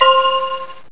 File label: ICE FORM; landslor